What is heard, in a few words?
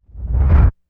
IMP FLAME, Cinematic Impact